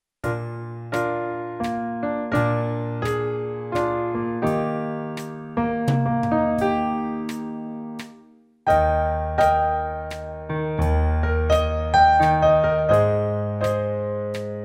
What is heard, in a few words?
Warm up Impro in Eb, brass; Level B